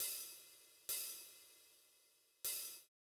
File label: Beat - Rides, 135bpm; Zero-G Sound Sense Grime Scene; GS Construction Kits; The Hoodrat